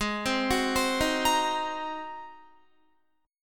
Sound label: G sharp 9th G sharp Guitar; G#9; 15,15,18,17,15,18; arpeggiated